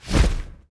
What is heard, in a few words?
Swamp Ent move; SwampOfDarkness